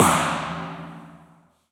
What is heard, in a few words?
Coastal Trail Tunnel; Tony Knowles; Impulse Response; EchoThief Impulse Response Library